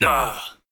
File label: death Man